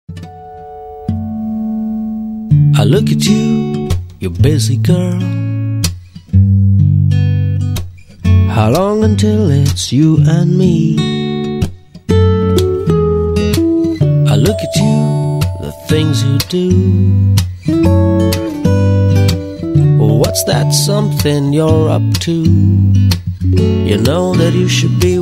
TV ad English song; Sunfeast Dark Fantasy chocofills

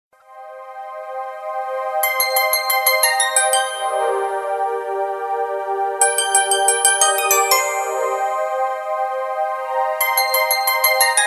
Romantic Valentine

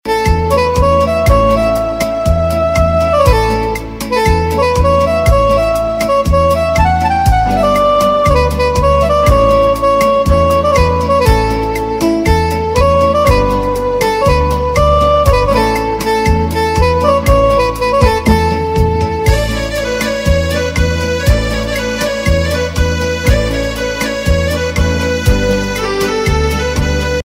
Instrumental Ringtone; Bollywood Hits (2014)

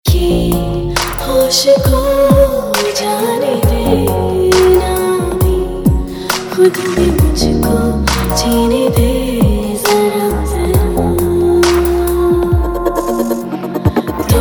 Punjabi Version Ringtone, Bollywood

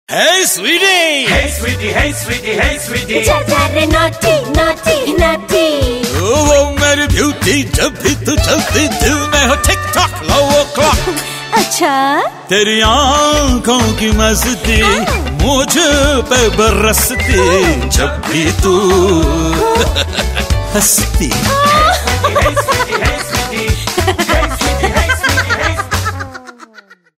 TV Ad retro Song Hey sweety

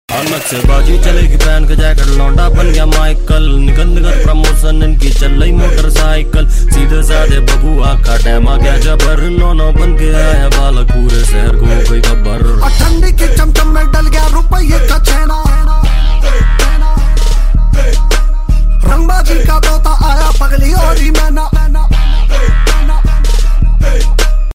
Rap Ringtone; Bollywood; Bareilly Ki Barfi

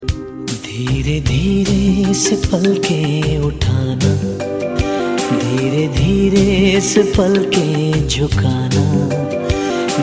Ringtone; Tango Charlie

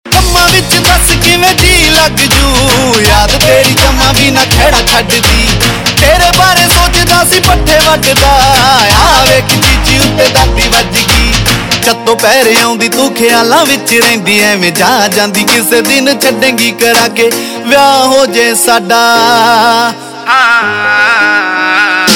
Ringtone, Punjabi

